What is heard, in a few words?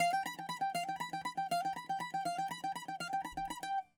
bouzouki